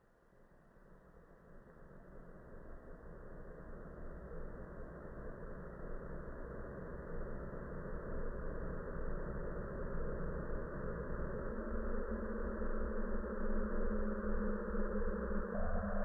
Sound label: analog noise study; tenney